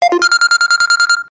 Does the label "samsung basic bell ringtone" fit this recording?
Yes